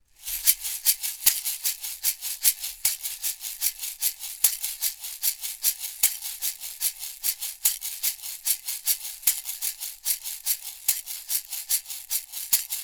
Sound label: warm audio wa-47jr shaker; warm audio wa-47jr test